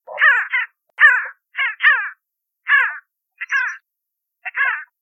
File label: grandule pin-tailed sandgrouse, birdsound